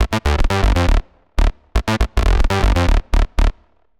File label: 120 C Crunchy, Analogue Bass; Loops; Touch Loops; CosmicFunk